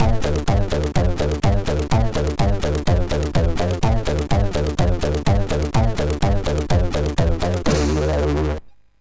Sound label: sid MOS6581R2